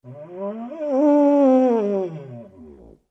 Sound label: Tuba Elephant Call